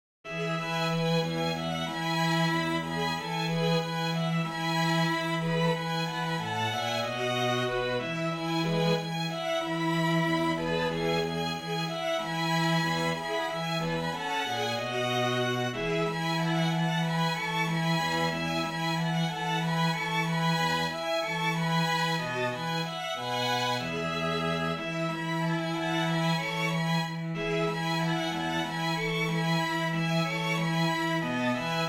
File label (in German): Streichquartett